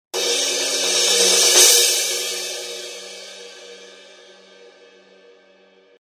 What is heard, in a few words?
Sabian 18 Vault crash